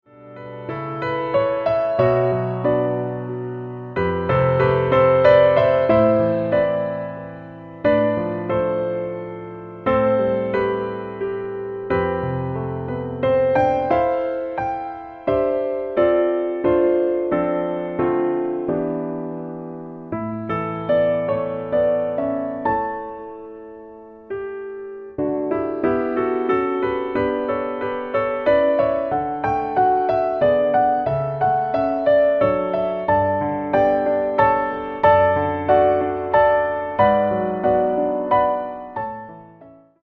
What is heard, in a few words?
Pno Low